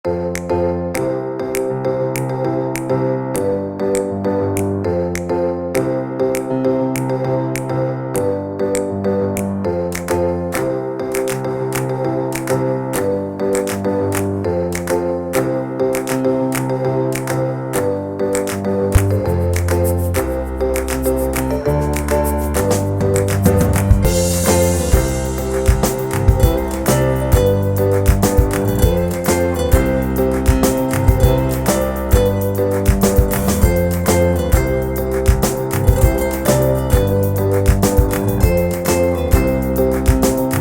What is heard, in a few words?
Happy Upbeat Background Music